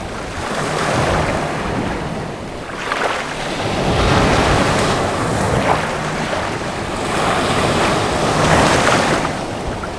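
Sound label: waves close loop; ambient soundscapes; dota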